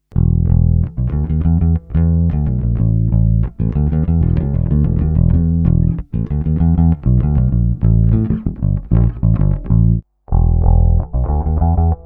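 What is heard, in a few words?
BH-550 L2000 P Bass Passive Finger; Fingewr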